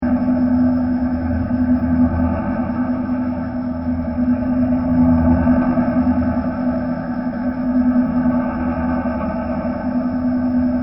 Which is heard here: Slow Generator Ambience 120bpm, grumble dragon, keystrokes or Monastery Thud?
Slow Generator Ambience 120bpm